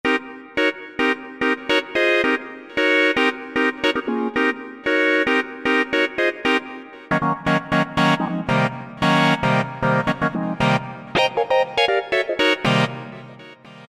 1 Finger Synth, Roland SR-JV80-19 House Collection